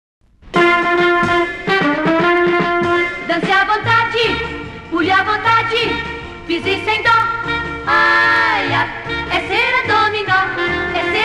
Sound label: jingle; cera domino